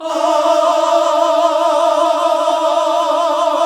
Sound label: GOSP. AAAHH; Sound & Vision - Gigapack II CD 1 (Roland); HAL Gosp. Aaah 2